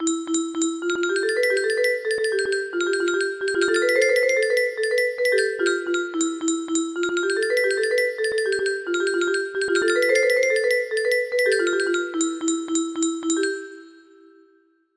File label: music tune